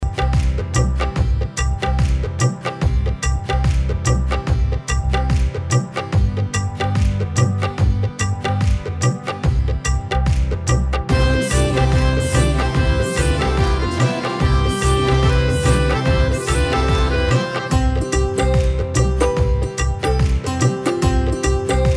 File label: Karaoke Song